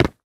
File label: concrete land; Golden Crayon Sounds